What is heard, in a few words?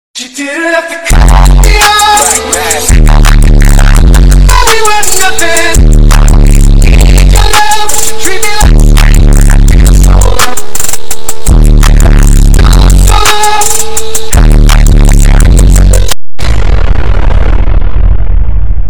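galaxy meme bass boosted ultra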